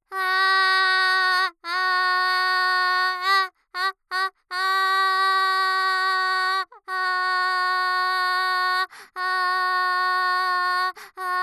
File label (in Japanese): あーーーーーーー